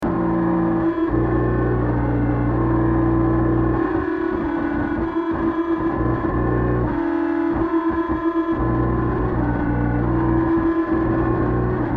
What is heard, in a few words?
Horn